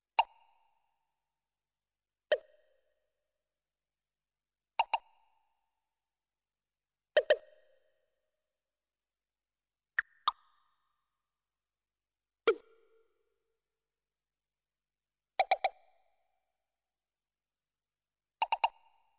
zvuk kapli

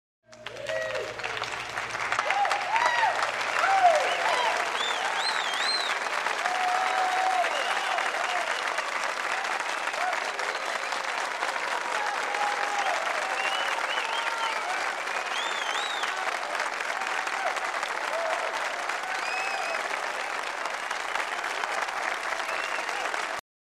odobritelnye so svictom

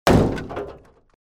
legkij udar

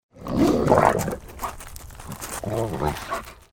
ruchit i ubegaet

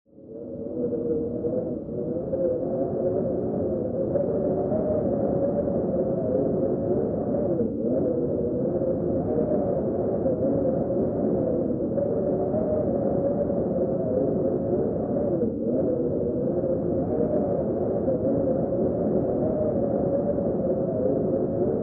metel

